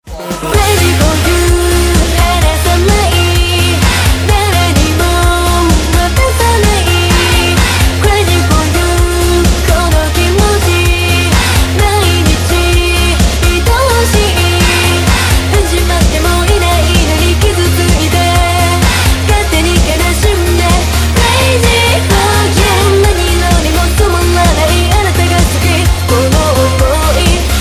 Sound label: New Wave Disco